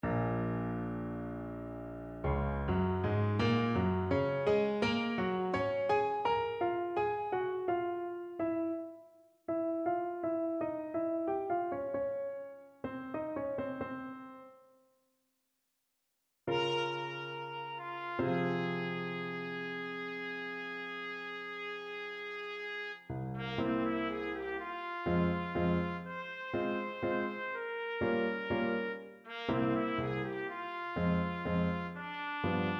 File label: chopin ballade op23 no1 trumpet